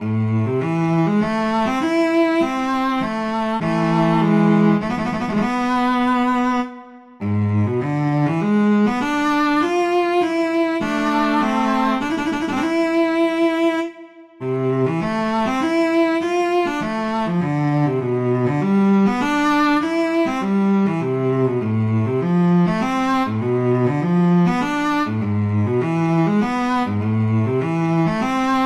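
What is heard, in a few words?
Etude, cello; Dotzauer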